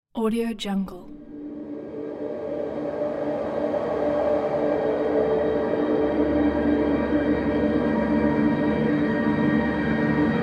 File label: black hole ambience